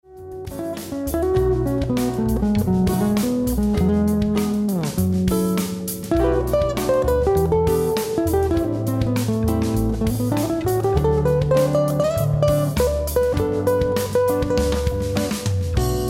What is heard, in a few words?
Ballad